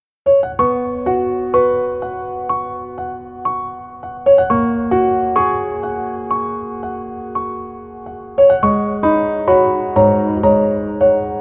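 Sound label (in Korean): Piano version 멜로디 MR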